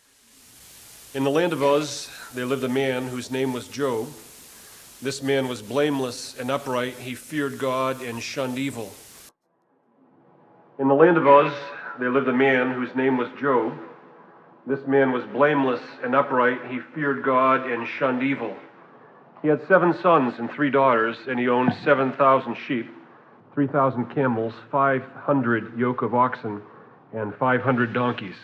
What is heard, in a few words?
Vocal Processor; Doofers; Thebellows